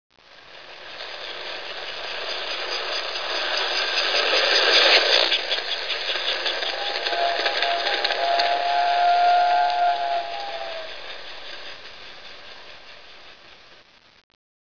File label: train